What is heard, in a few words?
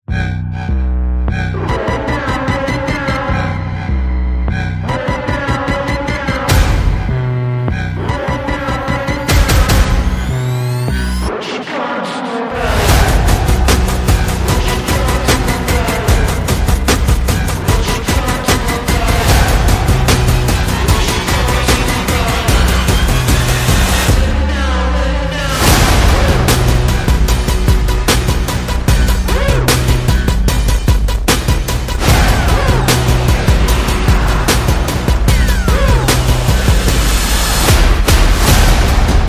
No Lead Synths